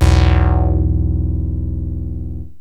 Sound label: 5th pulse, yamaha rm1x synth bass and lead